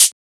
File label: Shaker